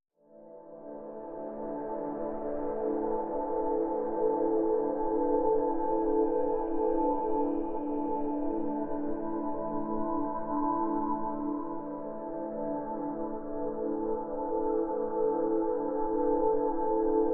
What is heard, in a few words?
Pads Bliss (D)